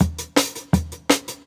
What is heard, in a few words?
164, Jungle Breaks